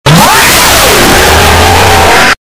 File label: jumpscare sound; one night at scars 7